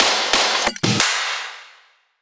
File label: fill 90bpm